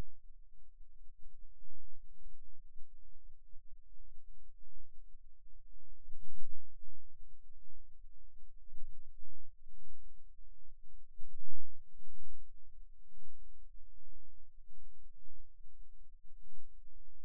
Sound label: Rhythm Wave, eeg seizures